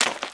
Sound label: fs mud; Spearhead Demo